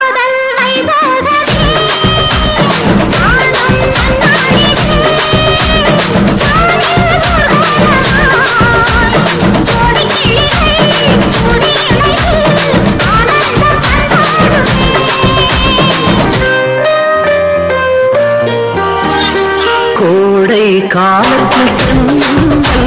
Tamil Remix Tamil Ringtones

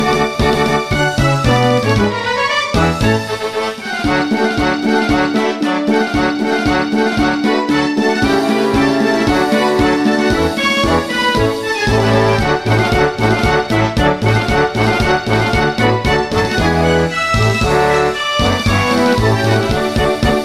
Lg V60 ThinQ 5G Ringtone

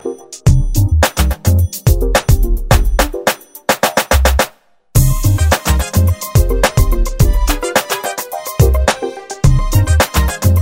Instrumental Ringtone

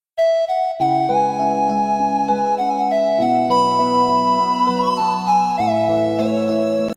Instrumental Ringtone; Titanic

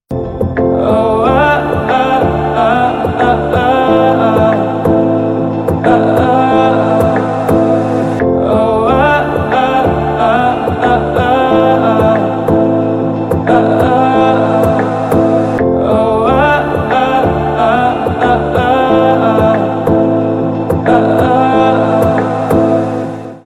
Cute English Ringtone

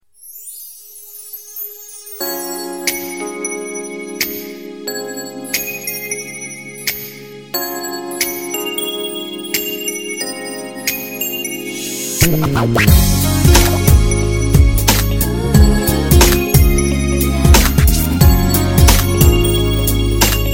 Best Love Music Ringtone

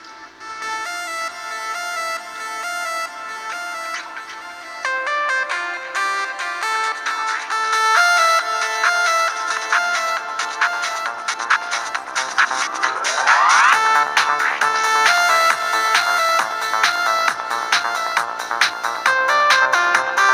Vivo Original Ringtone

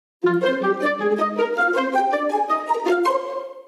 iPhone 12 Pro Anticipate Original Ringtone

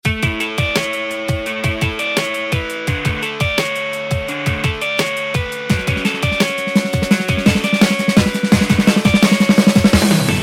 LG Velvet Ringtone

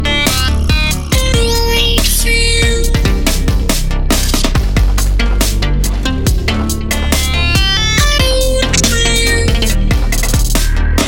Electronic Ringtone

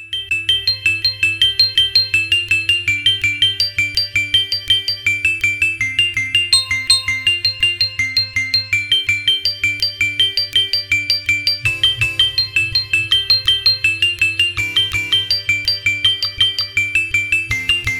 Xiaomi Ringtone

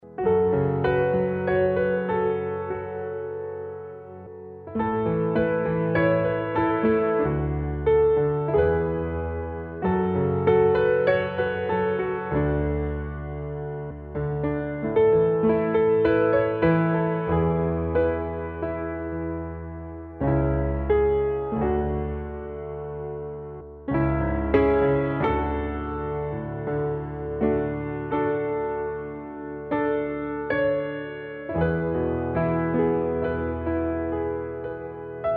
Romantic Huawei Tune

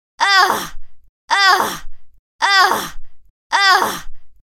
Ugh Ringtone

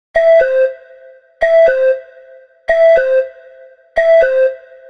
Xiaomi Redmi 9 Cuckoo Ringtone

